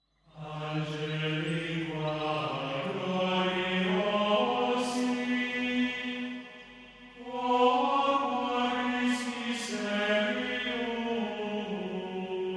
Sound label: Gregorian Devotion